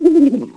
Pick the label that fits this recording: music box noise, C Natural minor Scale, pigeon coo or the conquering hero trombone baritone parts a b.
pigeon coo